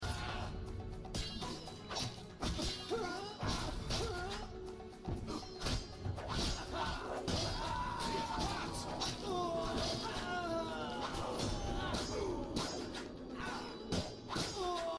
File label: fightsound; The Witcher